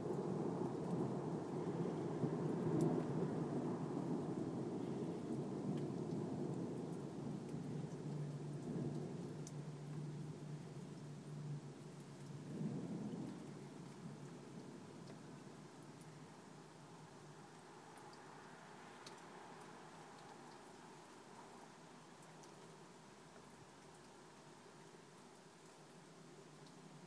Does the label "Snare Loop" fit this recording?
No